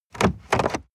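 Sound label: sports car interior glove compartment open medium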